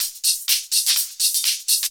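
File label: drum loop 125, hh+filterloops; PS-28 Vocal House Samples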